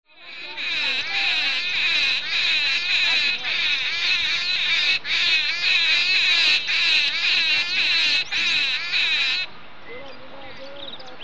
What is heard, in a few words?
Birds Other Sounds From The Desert Near Bundi; Field Recordings From India Nepal; Jani Hirvonen